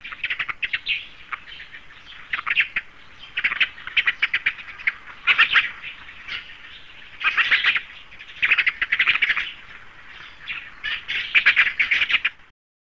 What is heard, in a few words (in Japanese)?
suzume; hataoridori